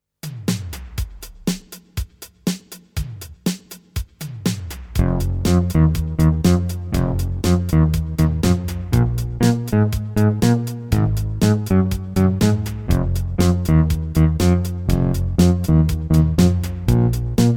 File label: Arp Quadra Bass